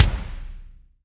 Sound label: BD, BD REAL